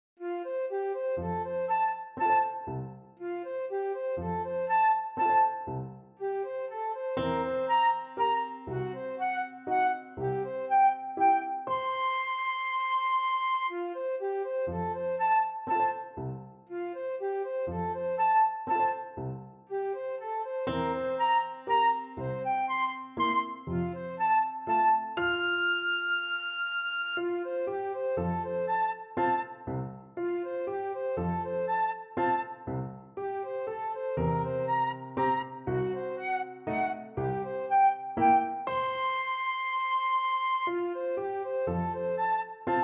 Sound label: valse lente flute